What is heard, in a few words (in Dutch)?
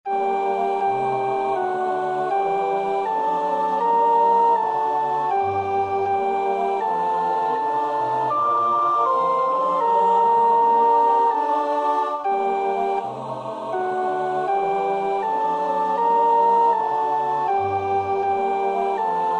sopraan, koorpartij oefening; Bach (Johann Sebastiaan); BWV 048